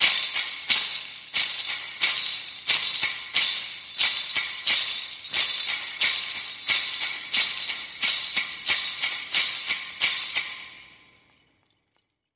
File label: Winter Sleigh Pulse